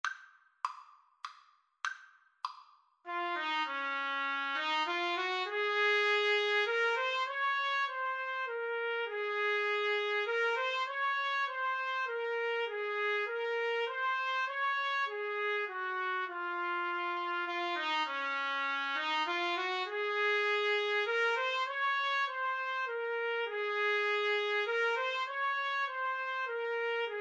first noel trumpet duet

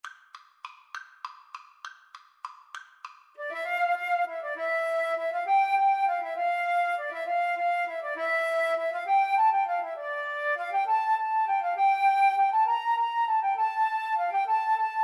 pirates, Flute Duet

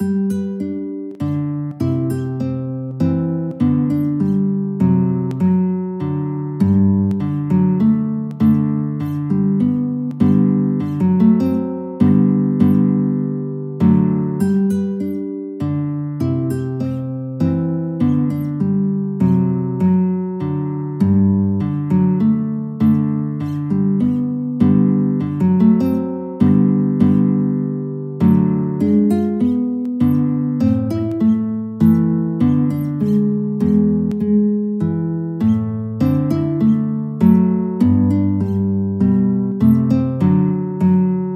Minuet, guitar

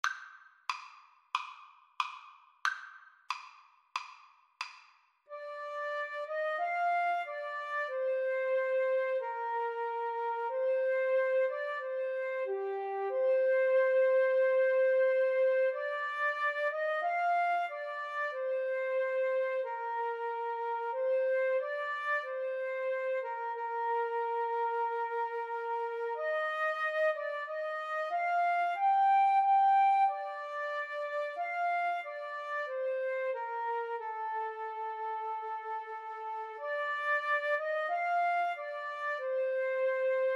bleak midwinter, Flute-Clarinet Duet

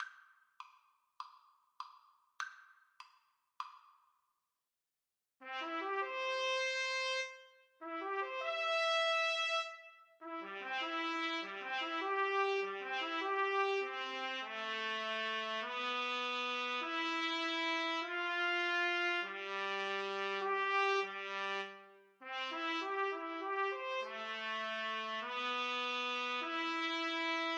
wedding march duet TPT DUET, Trumpet Duet

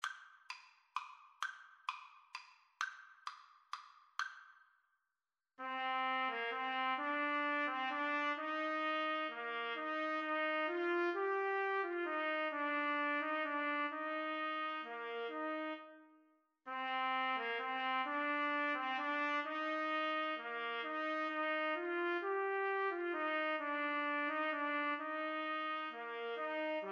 trumpet duet

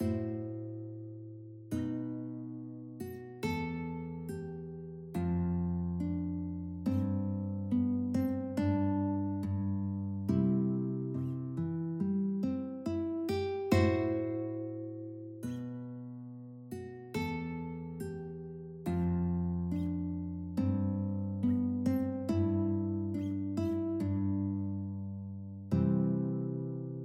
wedding march, guitar

